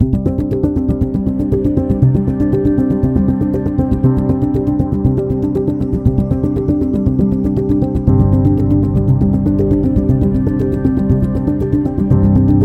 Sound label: Technology Advertising; explainer